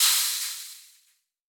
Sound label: Fire Extinguish; Boomerang Fu